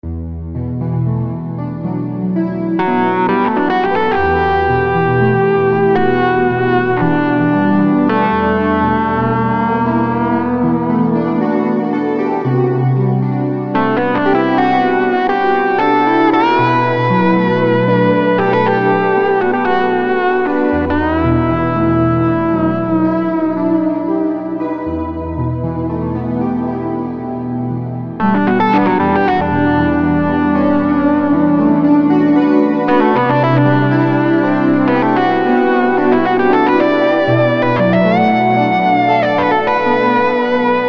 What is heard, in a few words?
ps3300 chorus and lead guitar